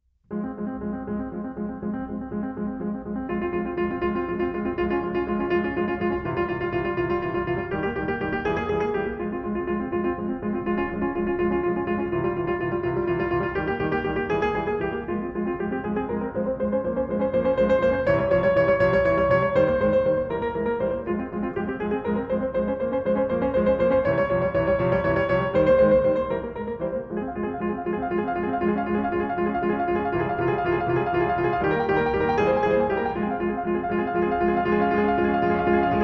Études For Solo Piano

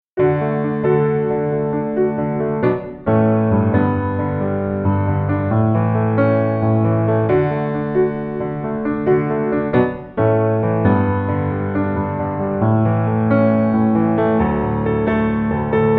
Instrumental

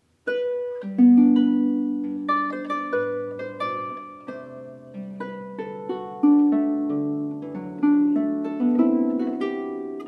Suite in G Major, Corant (Arr. For Harp); Z. 660

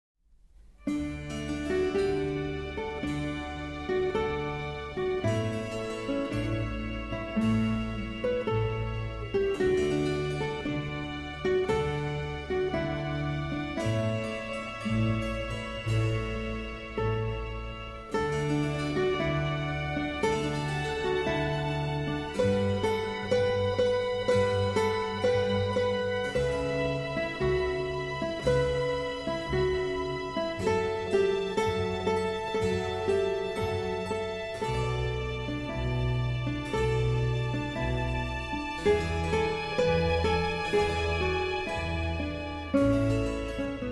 Concerto For Lute, 2 Violins and Continuo in D

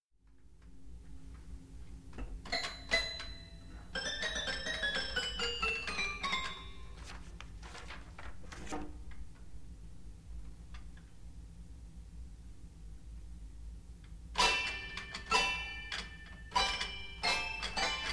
Toy Piano

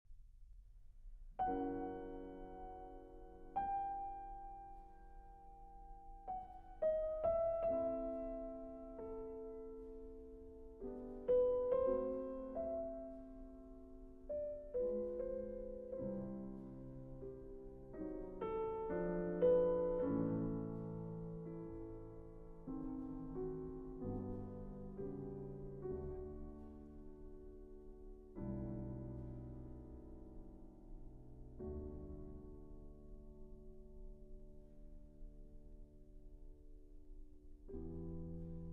Piano Sonata No 2 Non Allegro

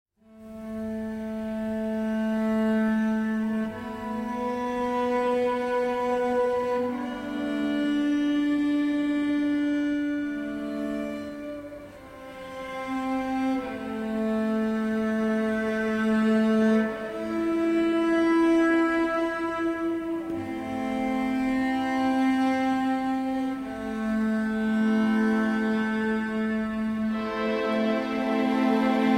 Remix Instrumental Version

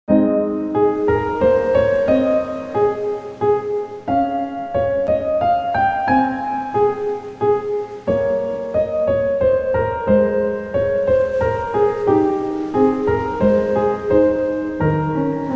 Relaxing Music - Minuet in G Major; BWV Anh. 114

